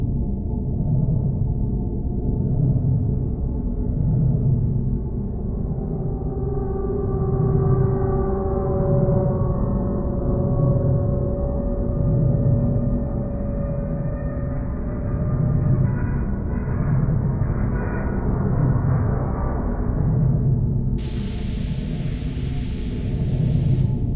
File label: Crashed UFO Ambience; XCOM Enemy Within